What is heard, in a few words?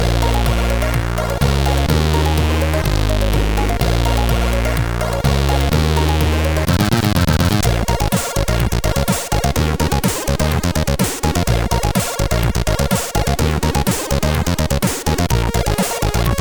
CSG8580; Penguin